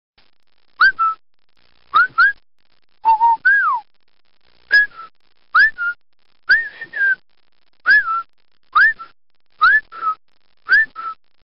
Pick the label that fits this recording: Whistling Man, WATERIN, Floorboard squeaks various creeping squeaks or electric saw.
Whistling Man